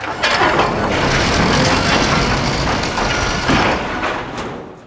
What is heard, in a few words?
open door; Mafie 1